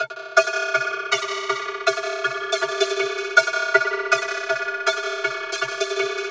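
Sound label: vocoder loops; Zero G; Malice In Wonderland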